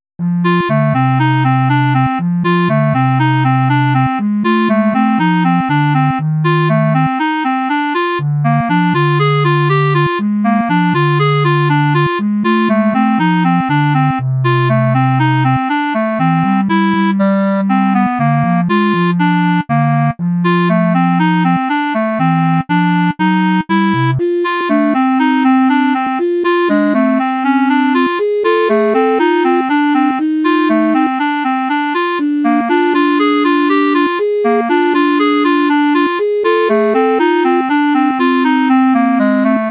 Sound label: Delta Blues easy